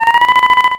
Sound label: squirrel